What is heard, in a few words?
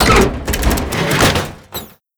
open, gravity glove machine; mechanical units